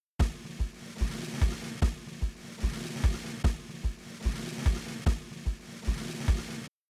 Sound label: Drums Roll, shuffle drums; WDR2